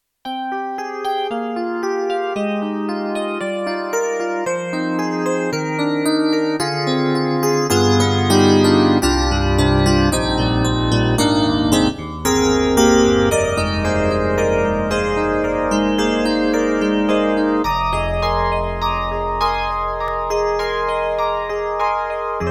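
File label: YAMAHA MU1000EX Chorus EP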